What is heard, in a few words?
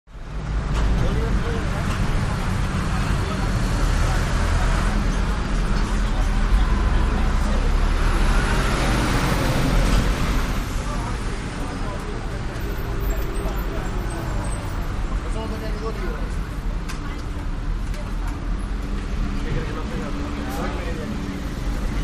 Street Amb Light Wal